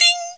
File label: Mounth Perc, Korg Wavestation